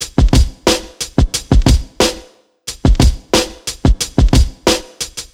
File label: Old, hip-hop